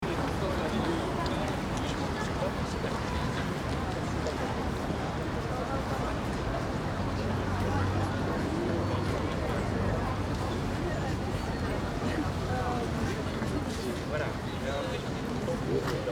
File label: Crowd chatter murmur street